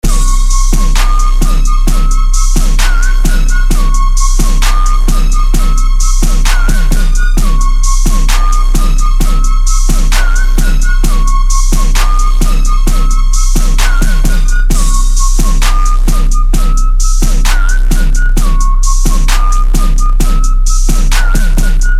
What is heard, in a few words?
Sinus 32Hz